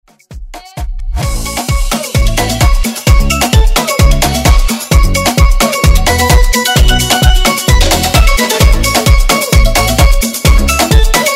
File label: Jingle Bells Afrobeat Pop Redrum